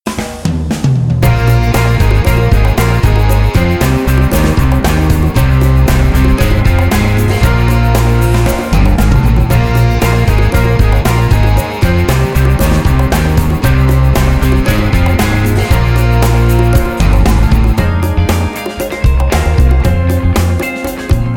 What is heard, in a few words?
Instrumental